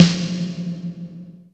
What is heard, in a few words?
METL; Back In Time Records - Big Bang